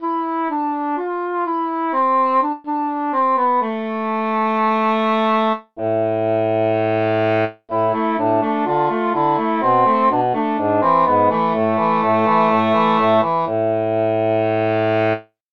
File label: english horn